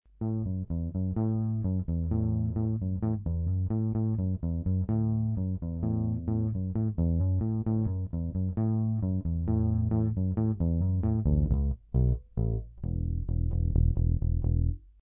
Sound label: EB3, bass; gibson